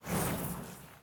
Burning Hands Whoosh Tails; Evolve; Meteor Goliath

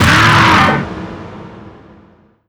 strider pain; Strider Remake

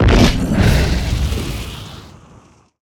Leap Impact Launch FLAME; Evolve; Meteor Goliath

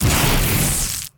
Melee Swipe; Grounder; Evolve; Kraken

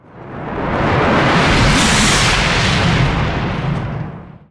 Lightning Strike Activate Start; Evolve; Kraken

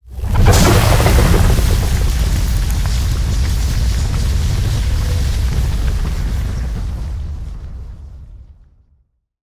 Mortar Cannon Flame Puddle; Evolve; Behemoth

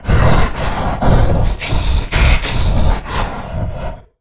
Stealth Breath Low Health; Evolve; Goliath

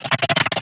Lurker Emote; Evolve; Gorgon

